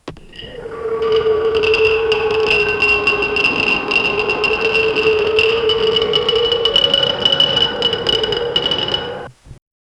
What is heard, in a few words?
the screech of straining